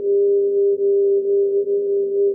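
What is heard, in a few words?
Ambience Sci-fi Energy Loop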